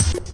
elektronnij zvuk treska

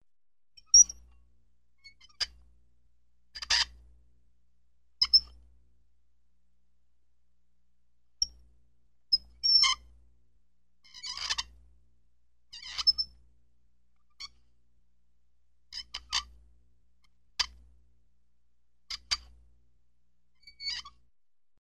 zvuk zakruchivaniya gajki skrip

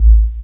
knopka cifrovoi shumnii priglushennii

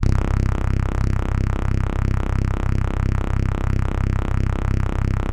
bass liniya

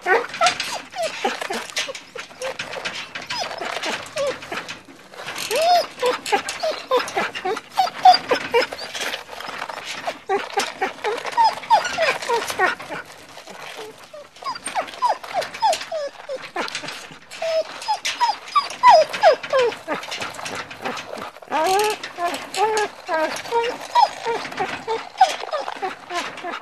sobaka ispitivaet bol

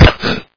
otdishka korotkaya

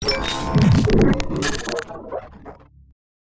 robot mini bot

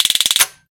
rokot elektricheskogo blastera pered vistrelom

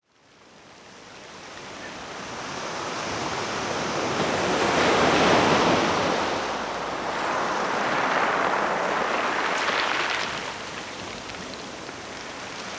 volni na galechnom plyazhe

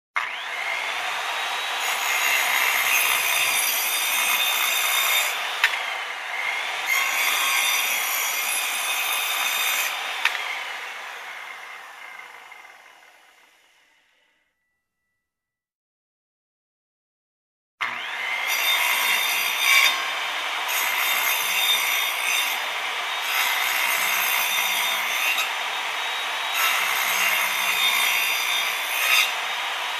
zvuki raboti cirkulyarnoj piloj

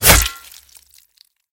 razrubayushij udar so zvukami lyushejsya krovi

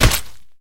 boevoj vistrel v myagkuyu mishen

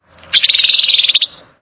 zvonkoe shebetanie

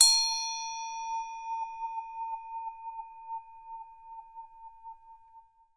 budiiskie zvuki melodiya zvonkii vibriruyushii

